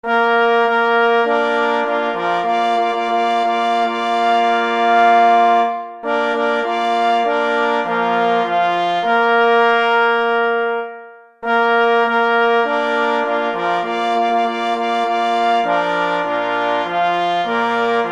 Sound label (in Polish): Fanfara na 2 plesy i 2 parforsy; Fanfara Rudenband; pies w muzyce lowieckiej dodatek